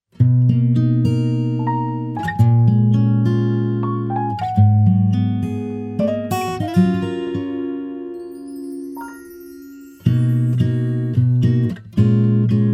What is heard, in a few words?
Acoustic ver